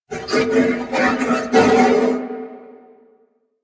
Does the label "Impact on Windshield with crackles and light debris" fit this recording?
No